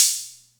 Atlantex Music Percussion Computer Cymbal Short, drum machine